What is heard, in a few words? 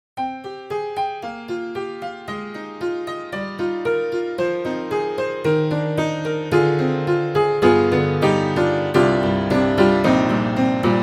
Upright Pianos Tack Piano The Balled Of Tacky Piano; Synthogy; Ivory II